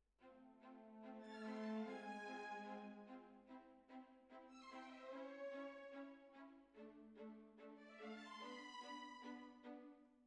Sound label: Oboe